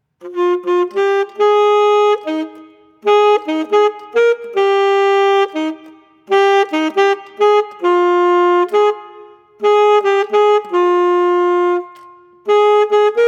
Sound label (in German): Saxophon